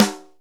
Reverb Kick Snare; PS-37 Dance Synths; Percussion

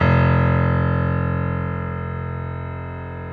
PF STB F 0, GRAND PF

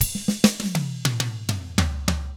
ROK, Drums

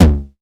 909 TOMS

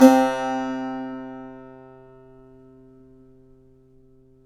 SAZ; Spectrasonics; Hans Zimmer Guitars VOL-2

